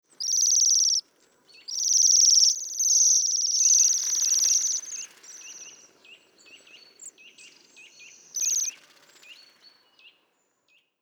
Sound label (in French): jaseur boreal